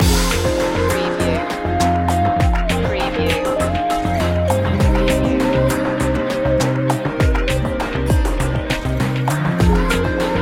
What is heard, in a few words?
inner world flash loop